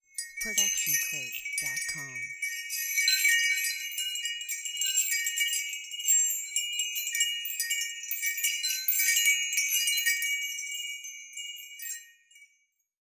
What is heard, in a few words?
Wind Chimes B